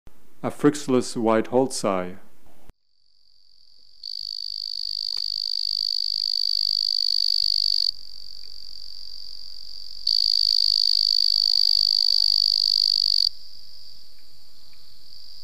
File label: Afrixalus weidholzi